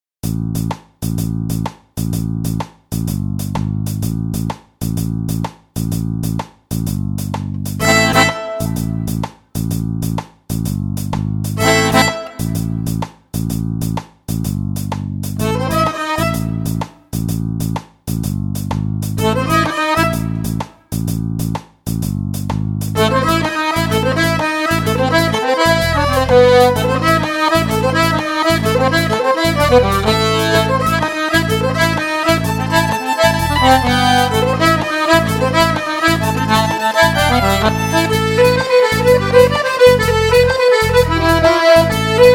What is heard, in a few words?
Jig; chapeloise austro hongroise; chapelloise